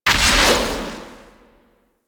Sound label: Jump Attack Start Whoosh; Enemies; Warframe; Infested; ZealoidPrelate